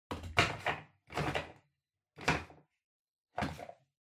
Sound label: Washer Front Load Filter Lower Remove